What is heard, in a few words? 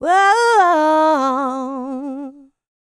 LICKS, Vocal Planet, R&B; ILIO; Dance; LT R+B